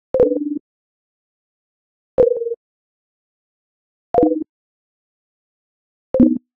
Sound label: Arcade Click Negative; boom library; The Interface